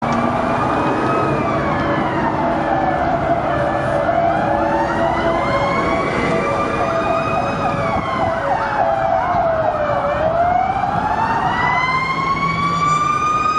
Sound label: siren sound; the wimpy protest